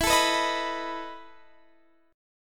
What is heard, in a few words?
E Major 7th Suspended 4th E down Guitar Drop D